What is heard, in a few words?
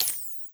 Pistol Laser Aim Exit; Warframe; Weapons; Tenno; Lasrian